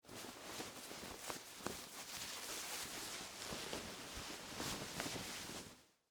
Suit Coat Put On, Foley, Clothes, Suit, Coat, Put, On; Dressing (Sound Effects:Human); BlastWave